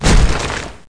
attack Pound; SFX; devastator; SAS: Zombie Assault 4

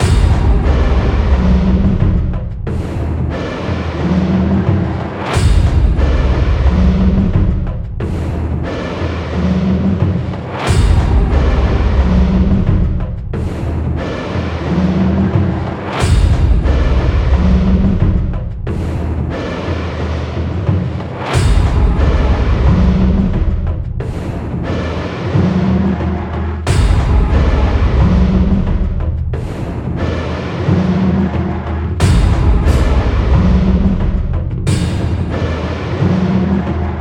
Drum Loop Music; Potato

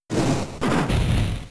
Blaze Kick; Pokemon; Gen 3; attack moves; RSE

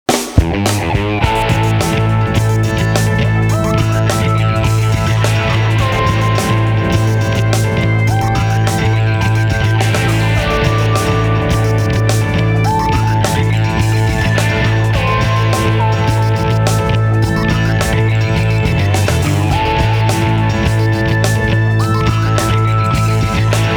Battle Lose; shutokou battle online 2002 pc

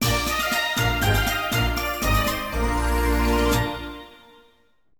Jingle Unit Skill Up

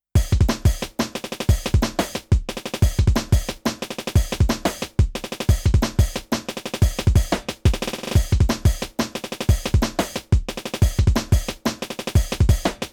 Drums Only; Captain Falcon's Twister Race

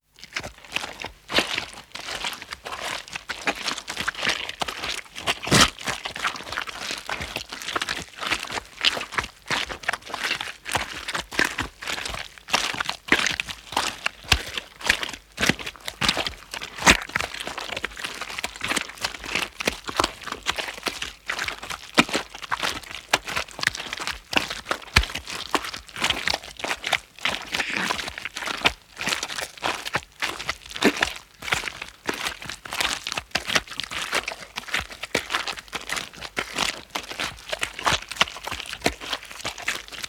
Footsteps Mud; The 39 Steps

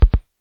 Drop; don t be afraid the first toy

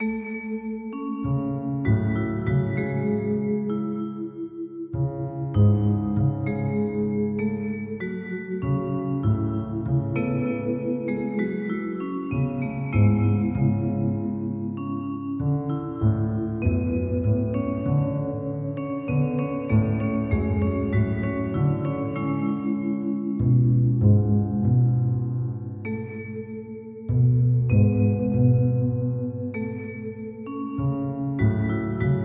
Musicbox; Niko: Through the Dream

